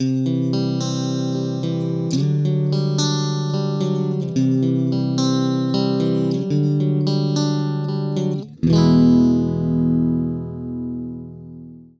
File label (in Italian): acoustic, Esempio di suono con simulatore di chitarra acustica